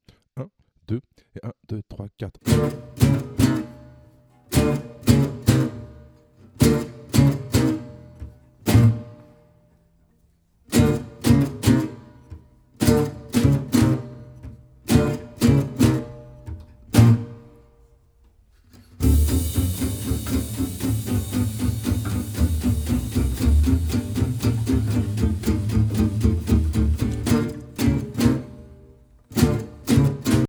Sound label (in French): swing dynamique playback